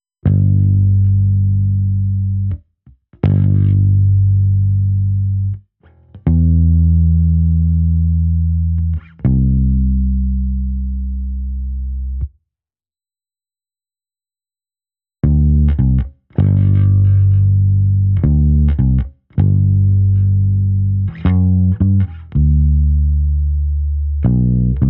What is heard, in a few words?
Kulor, Bass